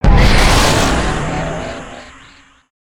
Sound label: pounce; creatures organic